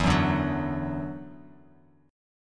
E 7th Suspended 2nd, down; E7sus2; strummed

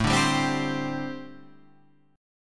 C Suspended 2nd A; strummed; chord; JGuitar

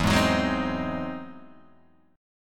E Augmented Major 9th, Guitar, Open G

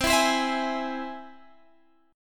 C Augmented, Guitar Drop D, chord

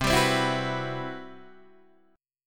C-Major Sharp 11th, Guitar DADGAD

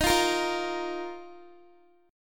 D sharp Suspended 2nd, Guitar; D#sus2; strummed; x,18,17,15,17,x

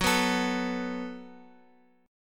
F sharp Minor Double Flat 5th, Guitar, Open F; strummed; down

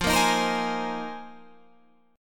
A sharp 7th Suspended 2nd Suspended 4th

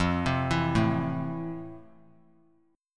F Major 7th F; arpeggiated; JGuitar

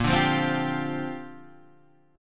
Bb 6th Add 9th Bb Guitar Standard; Bb6add9 strummed

